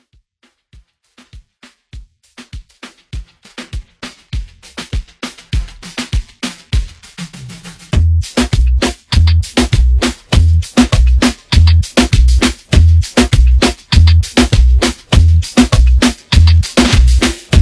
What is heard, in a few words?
Reggaeton